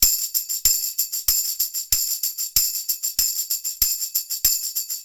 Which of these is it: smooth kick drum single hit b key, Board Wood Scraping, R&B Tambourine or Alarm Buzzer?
R&B Tambourine